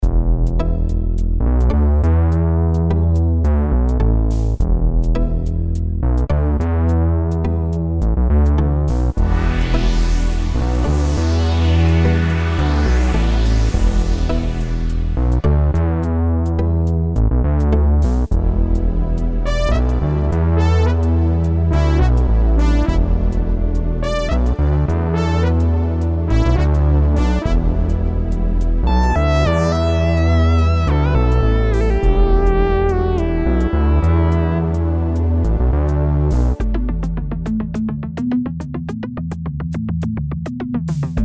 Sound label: Classic Synth; Rob Papen BIT